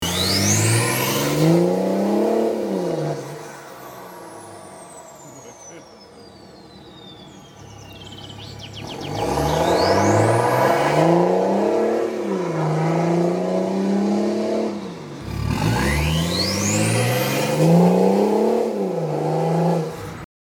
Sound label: BMW X6 3.0d Diesel